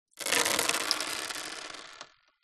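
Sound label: leisure toy plastic bingo balls handful drop wood; zapsplat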